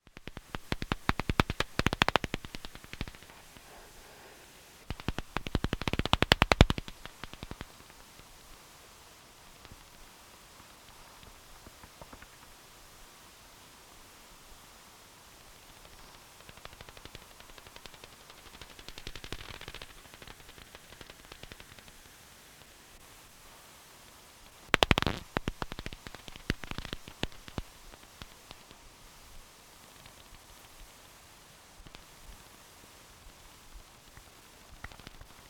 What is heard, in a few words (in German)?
Fransenfledermaus